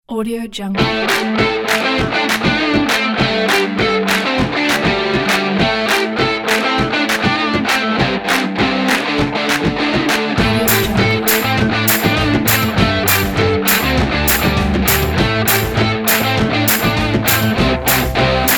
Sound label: indie rock energy